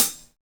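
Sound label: closed hi hat, Roland BK-5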